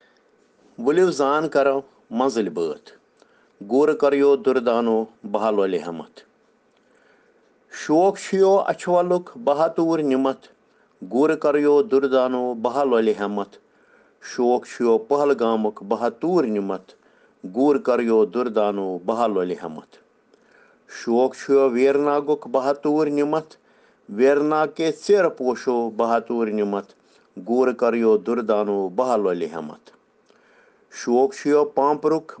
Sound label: Children Rhyms in Kashmiri